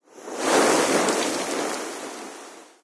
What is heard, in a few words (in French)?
vague